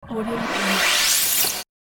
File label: sweeping laser zap accent; sound ideas